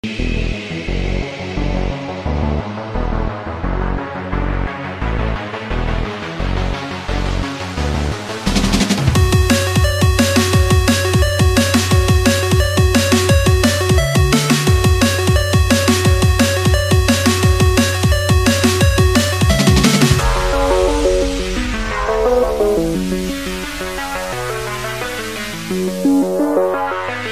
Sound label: UrbanStep Remix